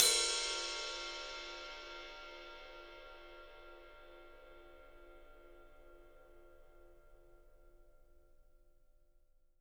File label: PW RIDE, RIDE CYMBAL, 3D Drums; 3D Sound